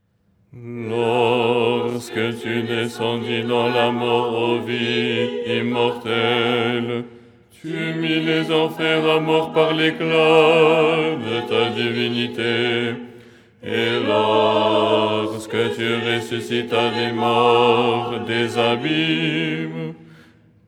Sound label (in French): basse